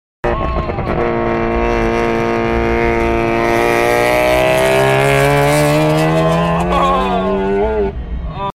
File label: loud rx7